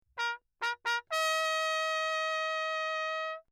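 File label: trumpet mute, Crown, signal; Lechner; Perinétventiltrompete; CrownMe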